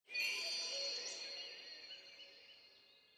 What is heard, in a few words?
Afternoon Jingle